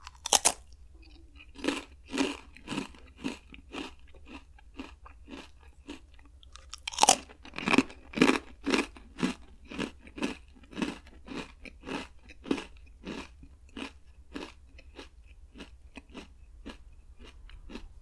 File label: rice cracker; eating